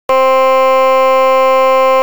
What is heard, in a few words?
Flute